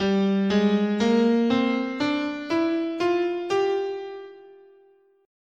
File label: G Phrygian sharp6, scale